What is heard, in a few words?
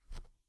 Grassy Footstep